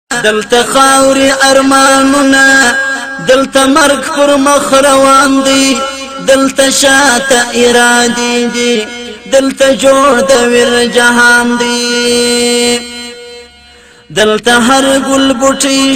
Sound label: Pashto Ringtone